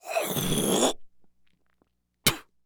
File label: Human Male Spitting